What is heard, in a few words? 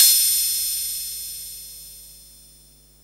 open hihat